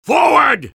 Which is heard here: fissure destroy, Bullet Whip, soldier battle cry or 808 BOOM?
soldier battle cry